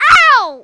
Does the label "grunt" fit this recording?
Yes